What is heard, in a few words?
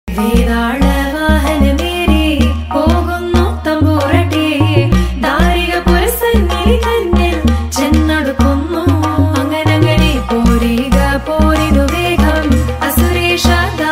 tamil ringtone